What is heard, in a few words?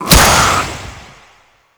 Juggernaut Quill Attack A; Warframe; Infested; Attacks